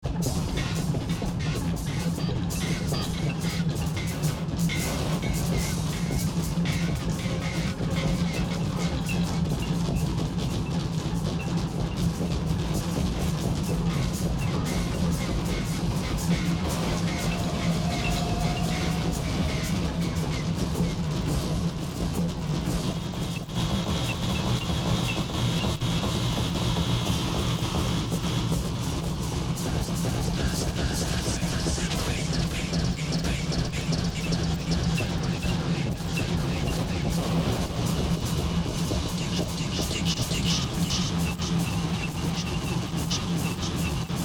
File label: ensemble